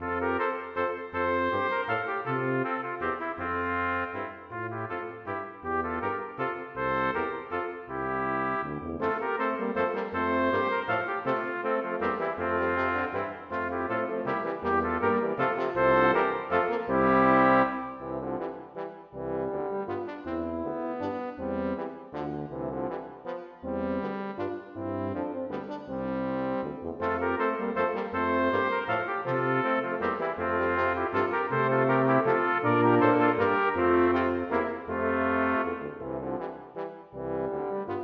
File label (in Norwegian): Menuet for messingkvintett